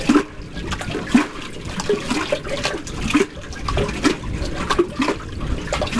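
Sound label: dock water; Splashdown; XBOX; Soultree; Mission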